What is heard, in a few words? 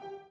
b piano